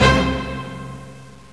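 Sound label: Orch hit c4, orchestra hit; Star Trek demo; Star Trek Audio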